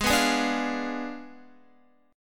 Ab Diminished 7th Ab, Guitar, 4F, 4A, 5C, 5G, 5C, 6E; Abdim7 strummed; JGuitar